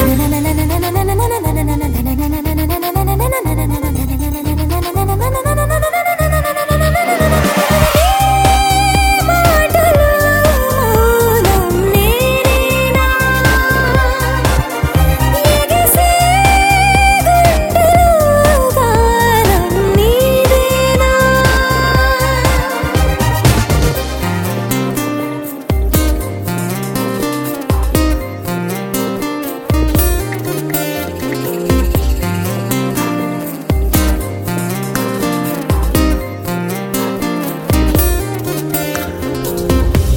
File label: Female Ringtone